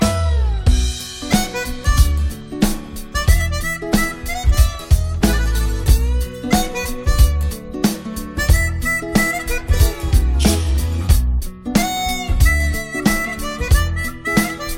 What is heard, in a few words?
Walking Up Samsung; Samsung galaxy note 8